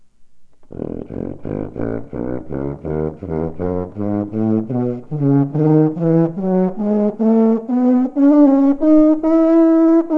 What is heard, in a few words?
3 octave scale